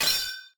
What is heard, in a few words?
katana clash